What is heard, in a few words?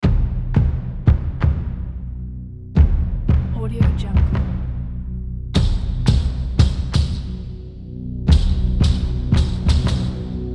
epic percussion logo; triple7music